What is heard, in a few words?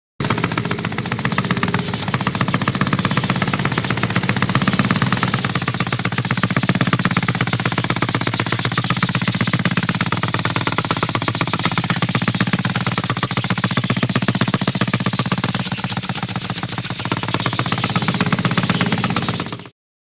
Constant Pump Runnin, Motor Pump; Small Manual Piston Up / Down Chugging Wi; Constant Pump Running.